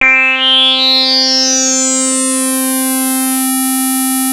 Anasound Long hi C, Industrial Analog Single Notes; Sonic Foundry (Sony Creative Software)